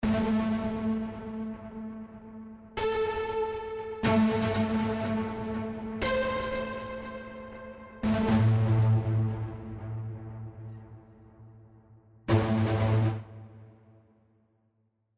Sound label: Damaged Pedals Straight